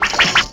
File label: flexisaw; Greytsounds; CARTOON FX